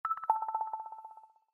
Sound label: Space Signal, notifications; Infinix; XOS 10